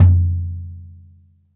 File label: bop kick - snares off; JazzFunkKit